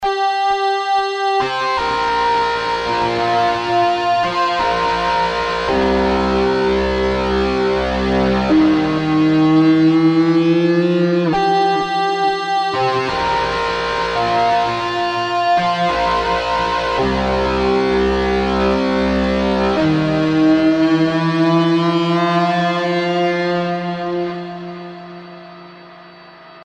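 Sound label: guitar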